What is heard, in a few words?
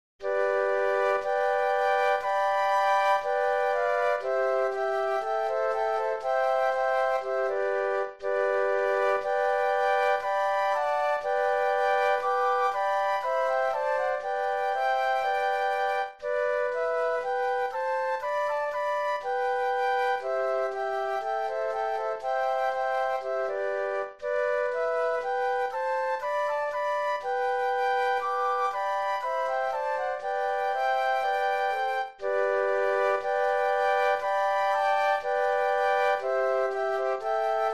Flute Trio